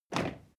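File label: step crowd march normal single left, footsteps; schritte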